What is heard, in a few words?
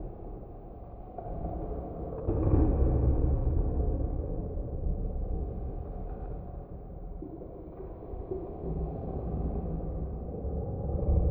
Atmospheric Cave Loop; Caves; underdark-abyss